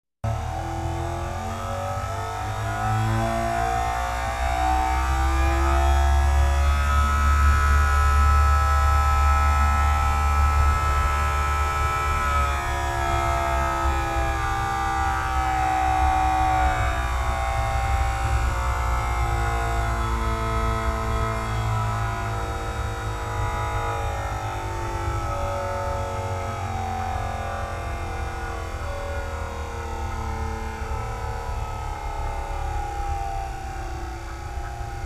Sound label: Drill Inter Barrel, servos